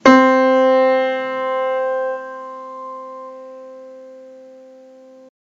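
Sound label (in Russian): пианино одиночный C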